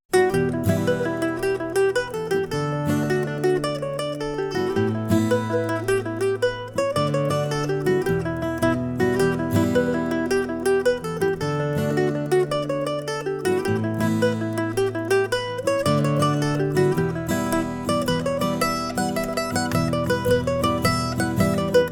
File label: the swallow tail jig